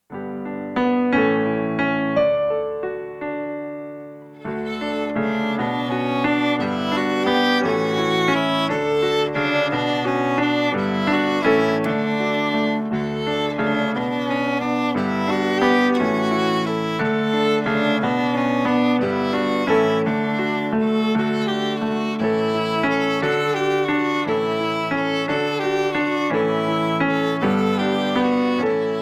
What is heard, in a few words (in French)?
fais dodo instrumental